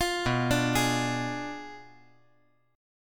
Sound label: B Diminished 7th B down